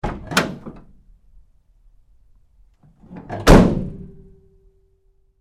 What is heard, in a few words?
Truck GMC Savana Door 2nd Side Open Close Hard; 2010 GMC Savana; Trucks; Big Room Sound